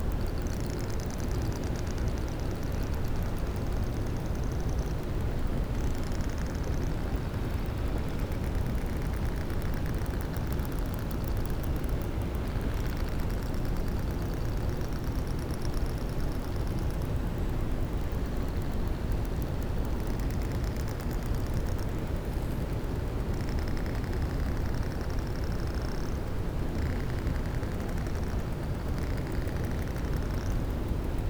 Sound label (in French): cachalot negatif, Physeter Macrocephalus